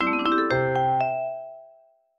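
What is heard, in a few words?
Duel Request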